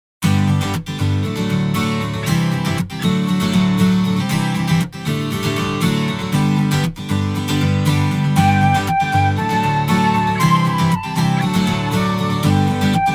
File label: INSTR